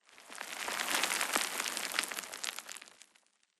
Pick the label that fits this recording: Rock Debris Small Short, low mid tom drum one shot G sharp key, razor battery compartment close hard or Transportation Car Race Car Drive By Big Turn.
Rock Debris Small Short